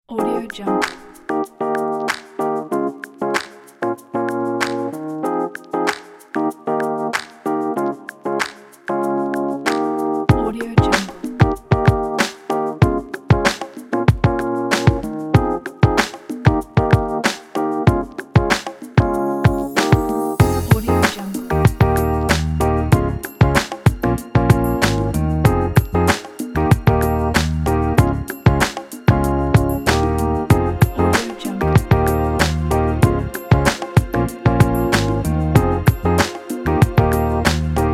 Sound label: rnb soul